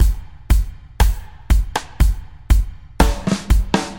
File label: Dub, Drum Loops